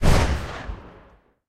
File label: mg 12mm m2 lastshot; guns; tanks; War Thunder